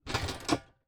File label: Foley Tire Swing Pushed Towards Mic